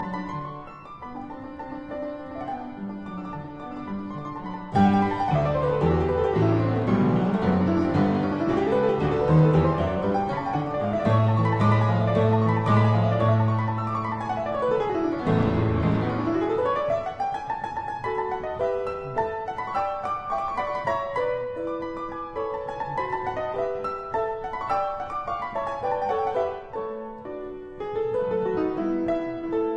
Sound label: Piano Sonata in G major Finale Presto; Op 40 No 1